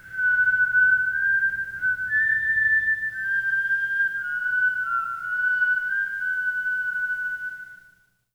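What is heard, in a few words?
kuchibue, whistle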